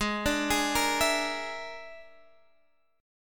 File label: A sharp 7th Flat 5th G sharp